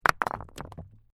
Rocks Fall Tumble, A small stone impacts and rattles with other stones.; Stone & Wood sounds; LYN100 package of Stone & Wood sounds; Rock Sound Effects